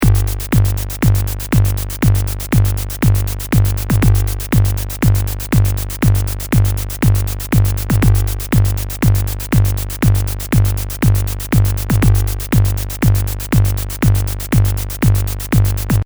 techno, 4つ打ちのテクノ風サウンド2; 魁Reaktor道場

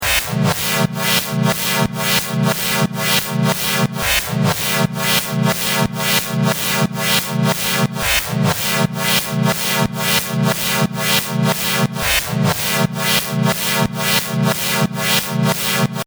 fm, FM系音色2 (FM系音色1にosc3をモジュレーターとして足したもの); 魁Reaktor道場

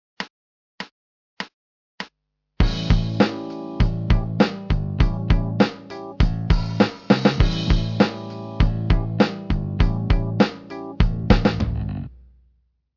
単音カッティングと単音ミュート・カッティングによる基礎フレーズ

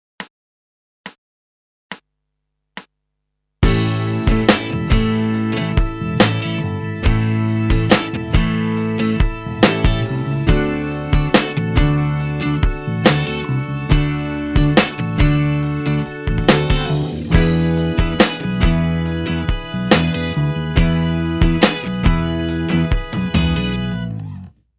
コード構成音を多用したペンタ･ミックス･スケール基礎フレーズ

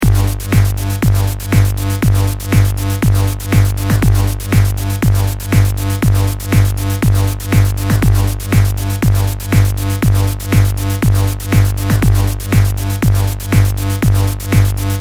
techno, 4つ打ちのテクノ風サウンド3; 魁Reaktor道場

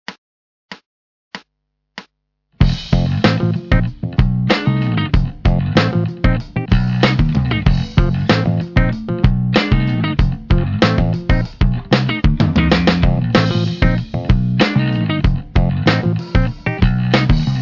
ブルースを土台としたおしゃれなソロ

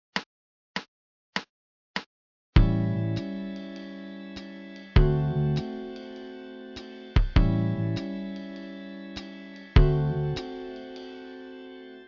ウォーキング･ラインの基礎フレーズ

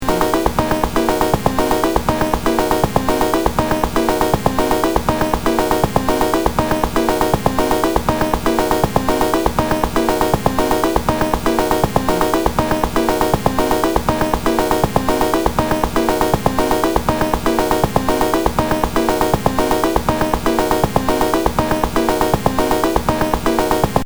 hard tech, オシレーター・シンクを使用した音色; 魁Reaktor道場

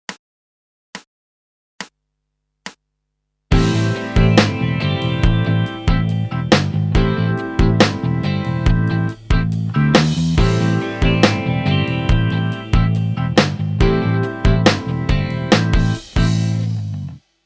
ドリアン･スケールによる基礎フレーズ

